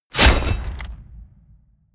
medieval click choose character

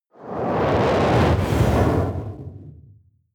military jet fighter shot damaged

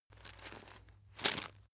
chain mail movement

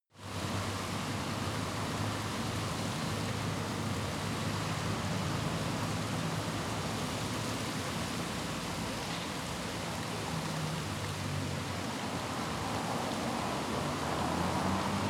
rain marketplace traffic

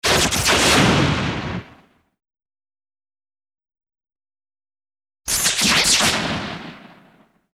phaser

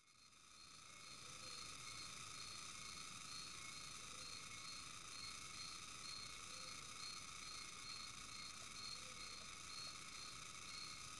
cicadas group

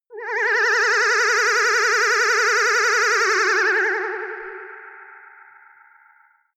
defect ufo

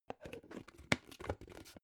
plastic bowl close soft

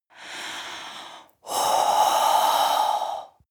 breath female eerie

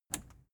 plastic button scratch push enable

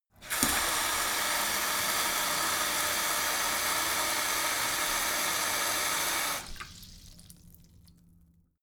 sink fill splatter hard long

